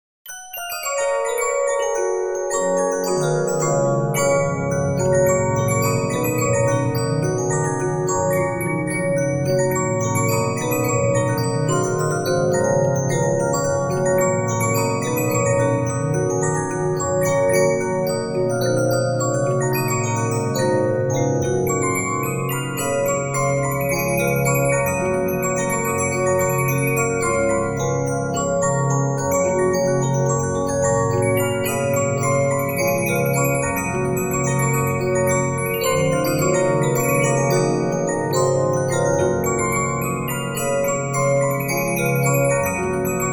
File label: Regina, 15inch disc musicbox